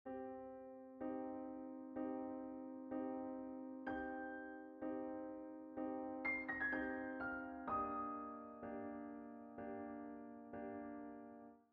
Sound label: octave melody